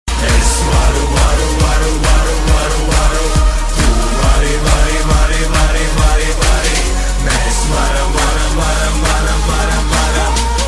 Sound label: win, music